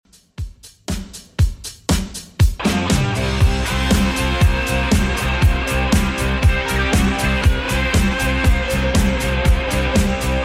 80s Redrum 119bpm

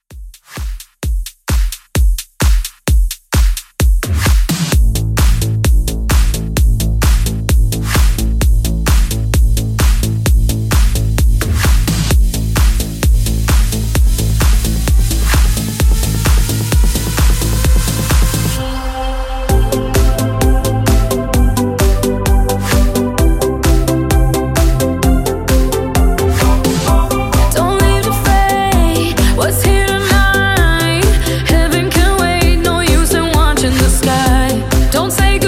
Club Intro Re Drum 130

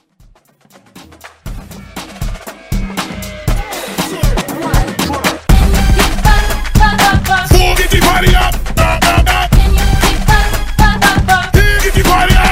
Throwback Redrum Hype

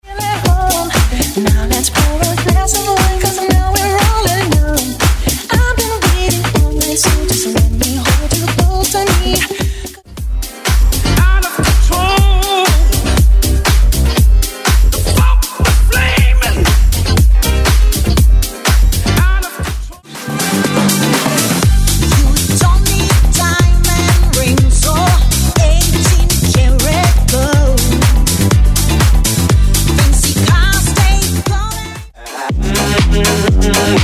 Funky House 118 126 Bpm

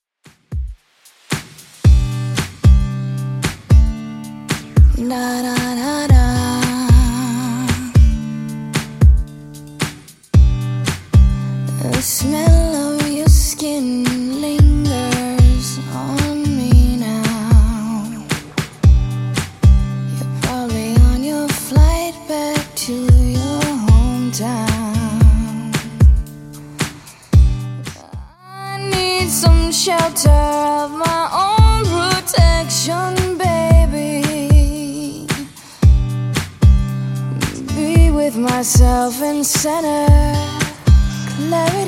113bpm

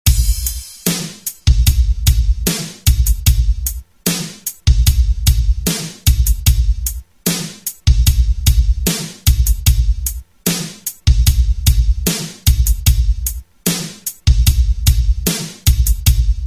Rock Redrum

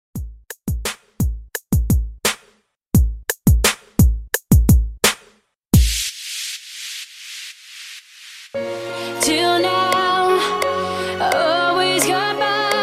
Funky Dance Re-Drum 86 BPM

